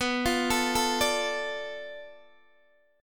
B Minor 7th Flat 5th B Guitar DADGAD

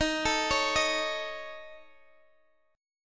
A Major Flat 5th D sharp

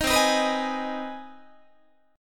C Minor Major 7th Sharp 5th chord, Guitar; CmM7#5 strummed; JGuitar

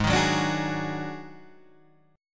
D sharp Minor Major 7th Double Flat 5th G sharp

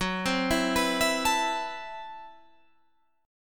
A Minor 6th Add 9th F sharp, Chord

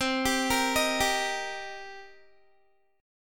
C Minor 7th C Guitar; Cm7 arpeggiated; 19,22,22,20,19,x

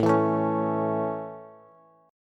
A Major, Bass; strummed

